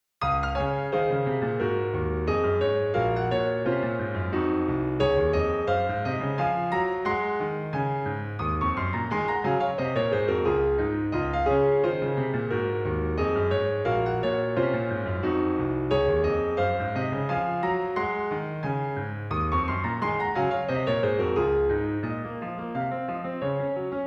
Trifle for Piano in Db Major

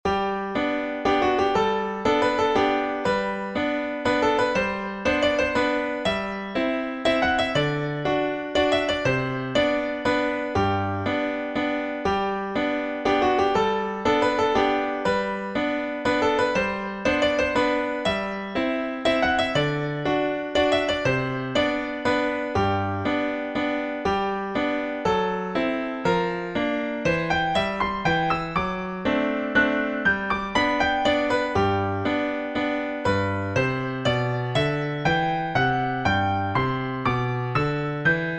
Waltz

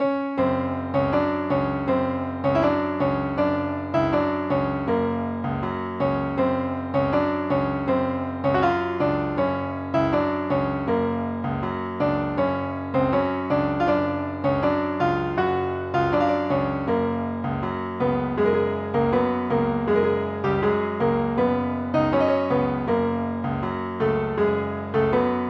Hungarian Arabian Tango

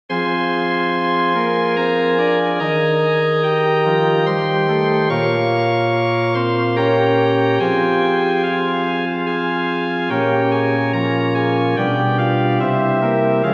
chorale in f minor

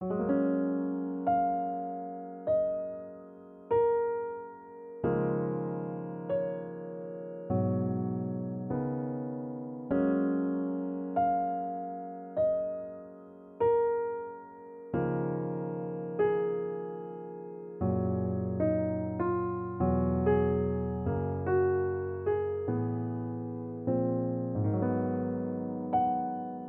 NOCTURNO Gb

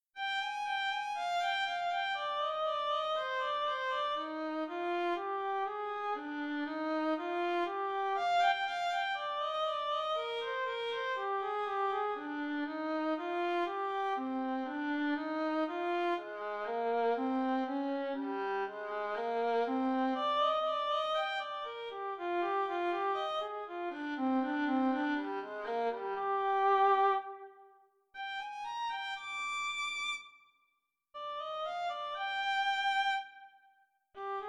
Soliloquy for Violin

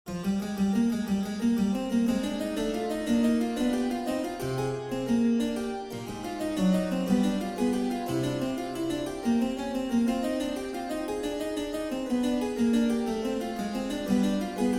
fugue in f minor

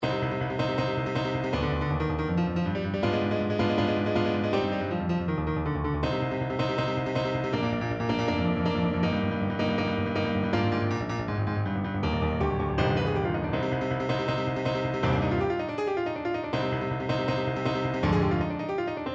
prelude in c minor

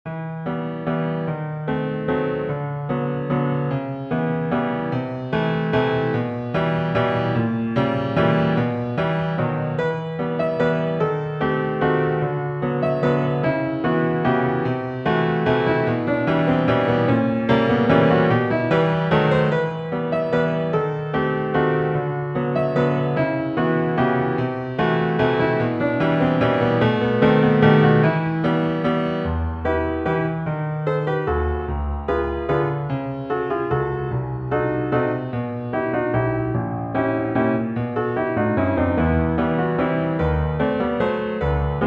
Passacaille en Valse; Il pendolo dell orologio

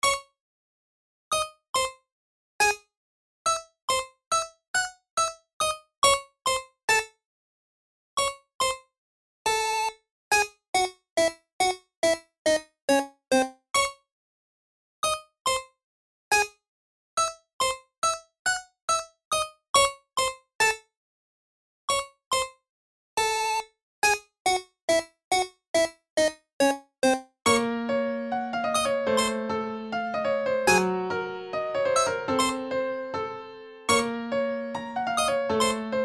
horror

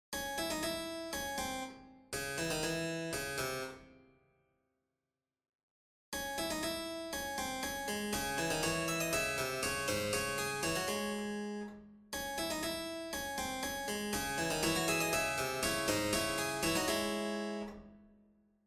canon

